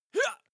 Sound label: male attack groan short; schreie; menschen